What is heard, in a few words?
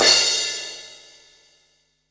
crash L; Zero-G; Chemical Beats; CRASH ZOO